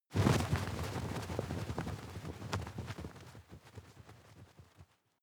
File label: fly movement flutter, comedy cartoon; soundpool